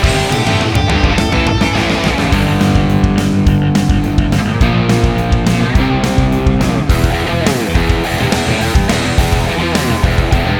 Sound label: MUSIC ROCK; Lego DC Super Villains 2018 Nintendo Switch